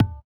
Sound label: tabla, Indiatek; Spectrasonics; Metamorphosis